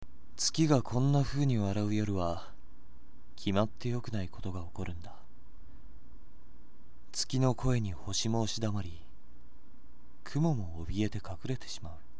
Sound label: mysterious